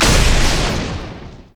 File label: thunder claws; LoL; volibear